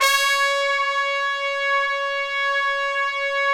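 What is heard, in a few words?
BRS, Brass Trumpets Section 3 f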